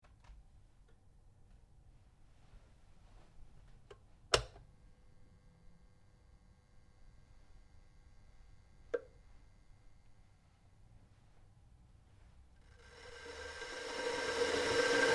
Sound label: KETTLE ELECTRIC BOIL FULL SEQUENCE, Domestic Kettle; Kitchen; Household